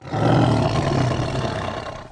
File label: tiger idle; Ringling Bros. and Barnum & Bailey; Wii